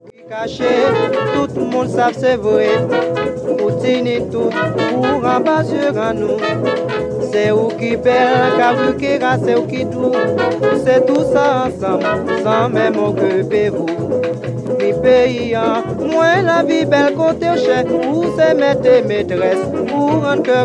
musique biguine; Conférence audio Laméca

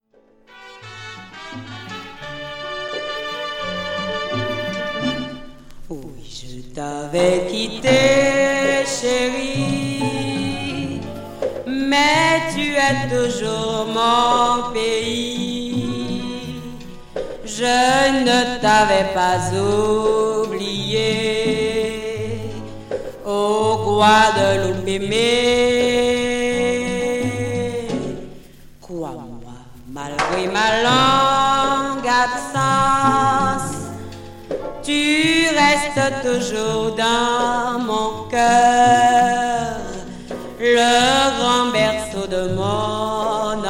musique Lotus Jazz Guadeloupe aimée boléro; Conférence audio Laméca

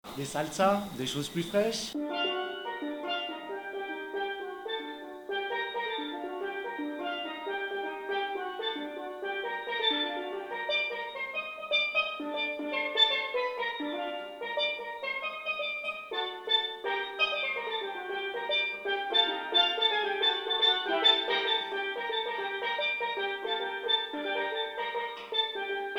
Demonstration musicale salsa; le steeldrum de trinidad et tobago

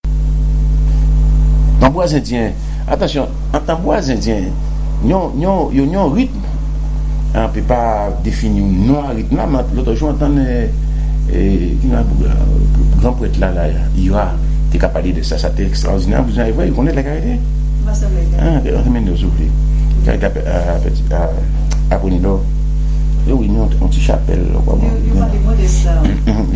Rythmes indiens et africains

